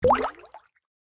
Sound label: ripple touchdown, LG G3